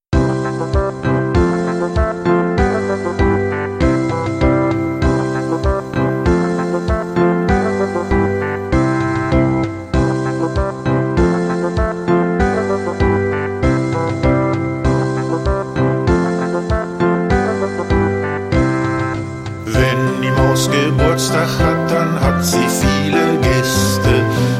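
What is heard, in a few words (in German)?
kinderlieder vocal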